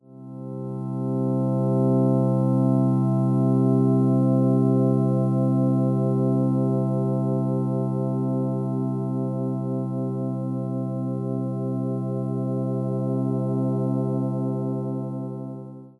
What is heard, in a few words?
heavenly pad